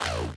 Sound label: droid servo